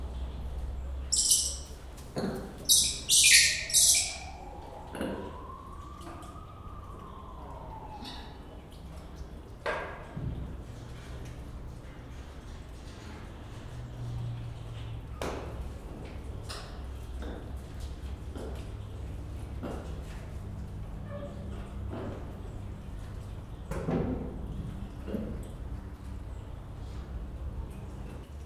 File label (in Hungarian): mélyhang sárgaszakállas maki magashang mókusmajom; kecskemeti zoo 2016